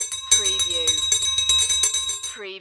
Hand Bell Multi Jingle

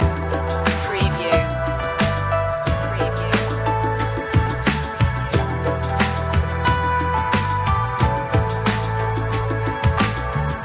jasmine flower loop

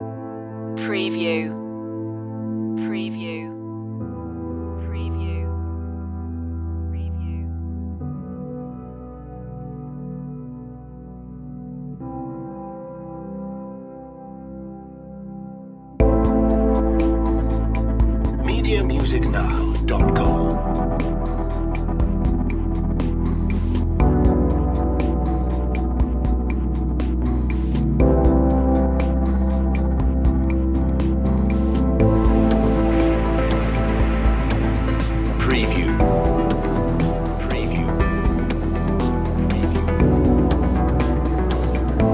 calm shores; 60secs

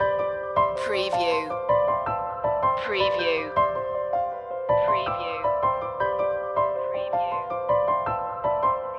pulse loop

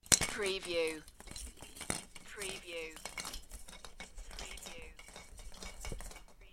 Christmas baubles knocking together; beanstalk audio

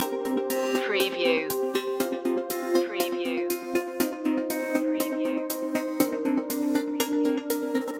smart beat loop

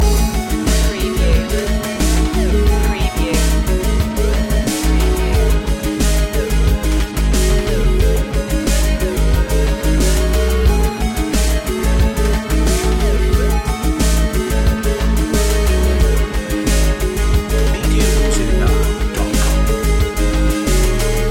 land attack loop

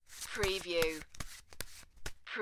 Cards Playing Cards Shuffling

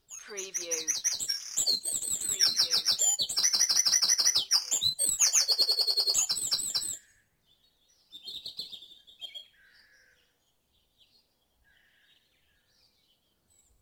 Dawn Chorus in Mold North Wales - Short Loop; beanstalk audio

